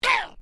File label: Created Creature Small Ouch, Alien Sound Effects